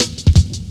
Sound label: Steppy Cut, Jungle, Drum Loops 168.5; Zero-G Jungle Warfare 1, 2 & 3